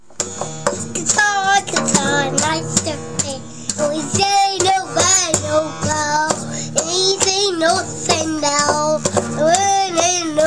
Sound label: guitar song